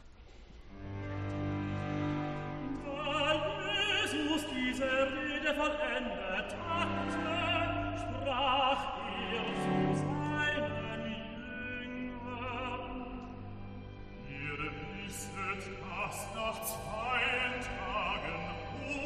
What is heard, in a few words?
Recitativo; Johann Sebastian Bach; Pasja wg sw. Mateusza BWV 244